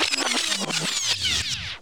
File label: backspin, Vinyl; Misc Percussion